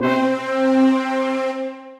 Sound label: Stac Brass & Synths; P-50 Linear; Retro Synths; Ableton; Factory Packs; P-50 Structures